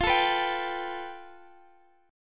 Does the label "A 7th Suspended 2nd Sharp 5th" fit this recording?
Yes